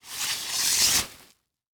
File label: Sports Stake Wood Stake Sliding Into Tent